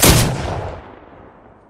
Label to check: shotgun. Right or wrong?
Right